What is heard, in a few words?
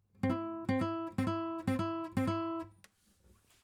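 acoustic pattern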